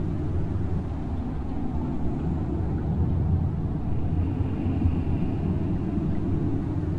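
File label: big cave ambience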